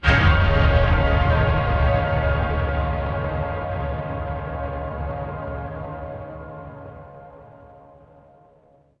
horror stinger

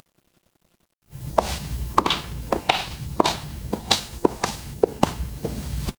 footsteps heels

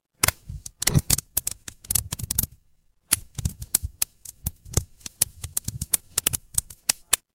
fire electric crackle

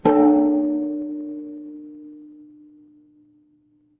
instrument gong

